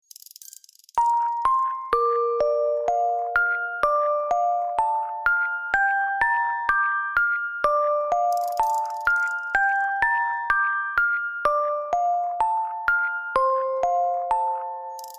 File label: Music Box